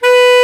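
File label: Bass Clar mf B3; Digital Sound Factory Virtuoso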